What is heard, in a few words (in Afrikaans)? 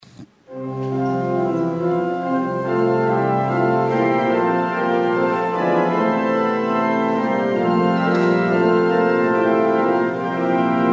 Gemeentesang; Psalm 31-1 verse 15,17 (2de melodie)